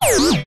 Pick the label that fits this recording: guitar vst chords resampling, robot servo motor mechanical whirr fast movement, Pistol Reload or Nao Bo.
robot servo motor mechanical whirr fast movement